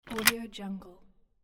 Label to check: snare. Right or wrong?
Wrong